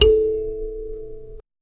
kalimba mbira thumb piano

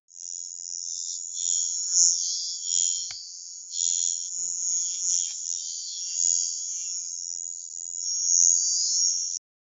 a buzz sound effect for, robotic

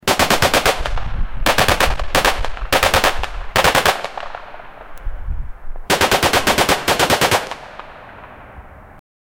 ak47s shooting at each ot, street

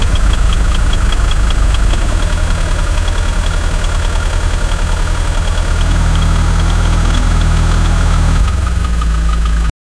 a bradley ifv engine star; armored vehicles

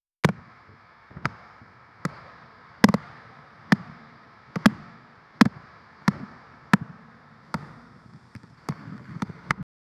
tightening a speed flex; equipment

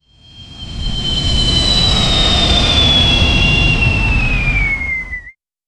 rocket launch sound

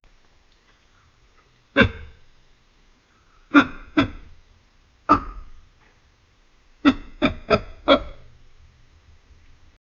a thud, impact

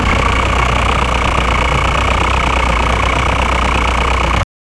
diesel the brave engine s

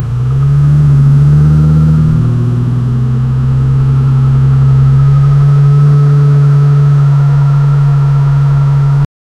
engine sound in sport car

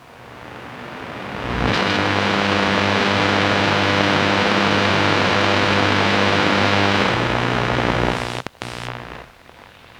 analog radio power on sof; button click

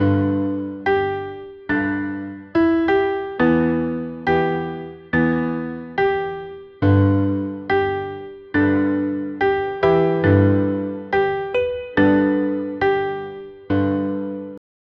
cute piano jingle

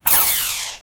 magician casting simple spell; spellcasting

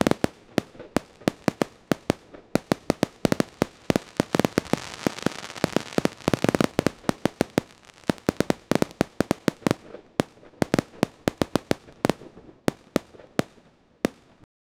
a burst of fireworks fade

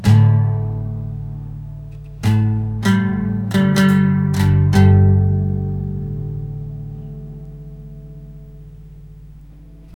acoustic guitar playing

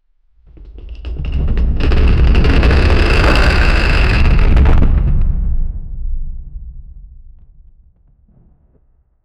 heavy steps of a giant

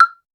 african inspired button

